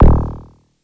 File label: DARK PER, TECHNO, Drum Base; Crypton - DrumBase5000; TECHNO #2